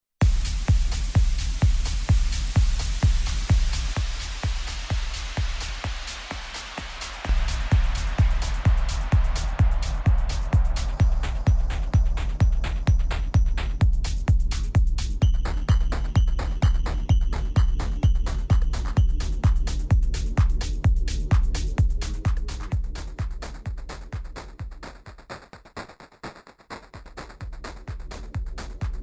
RMX 60 Bitcrusher

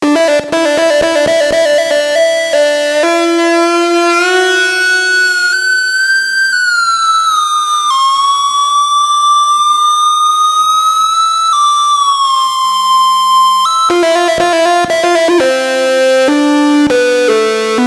JD-800 Model Expansion

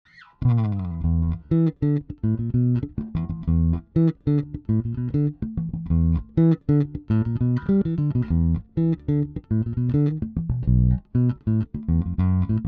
Both full finger

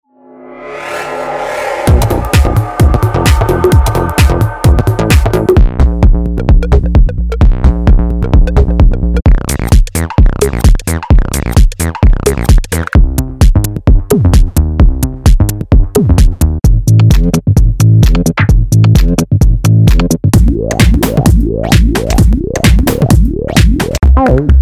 Minimal Tech House; Sample Magic

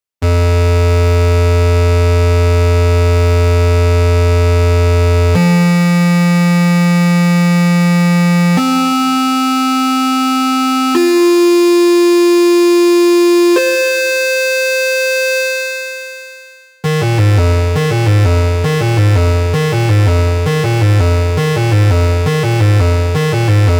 Rectangular

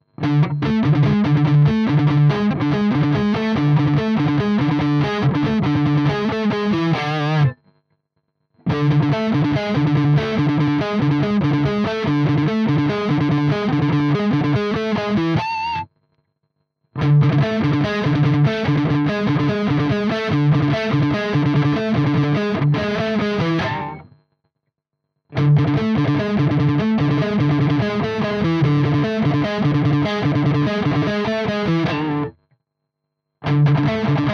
rhythm Riff

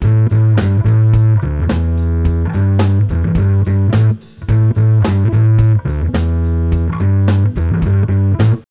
Blumes Mode 2 Gain max Tone max; Earthquaker Devices

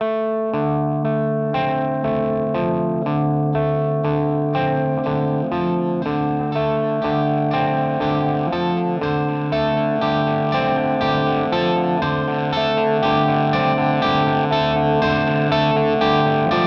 REVV G20

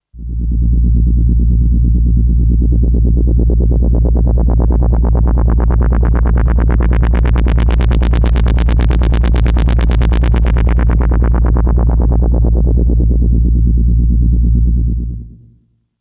Pro600 Heli

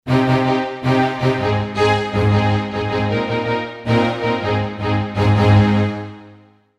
Fabric XL Attack Strings

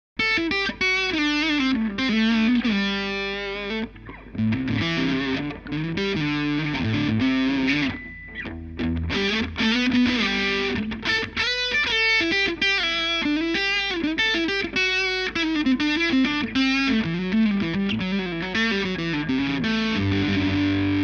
full gain boost lead

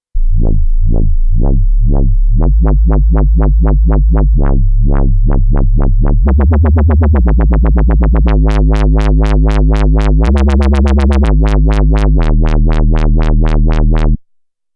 Bass; KOPRO+

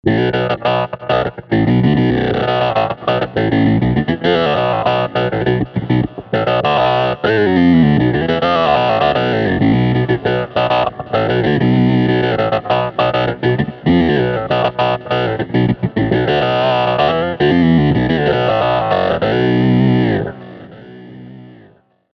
vocal synth

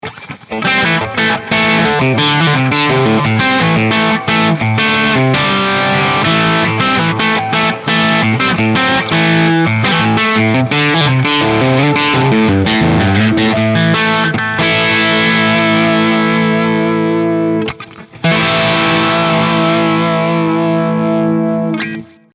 ECC83 FAT max. Gain

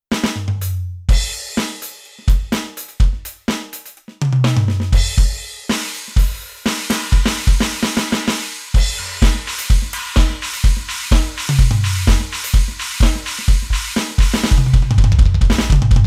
TD1 Set

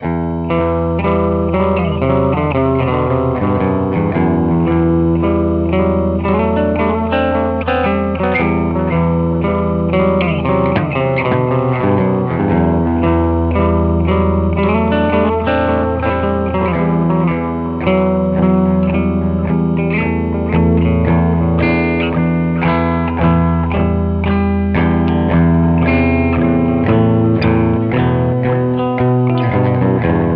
Delay Decay

